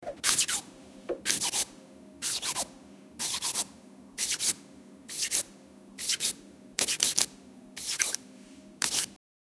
wiping cleaning sound

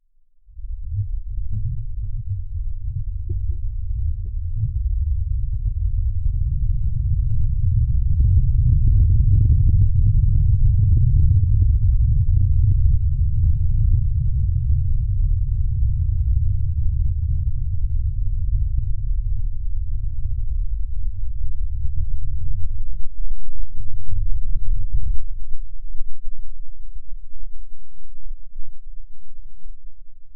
a huge meteorite flies an

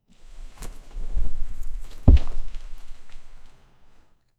dog leaping onto a sofa

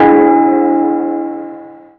deep brass gong chime

